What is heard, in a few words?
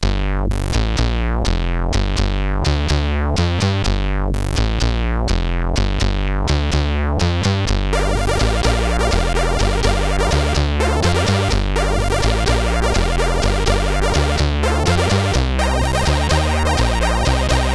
Blues, CSG8580R5; HVSC; Argon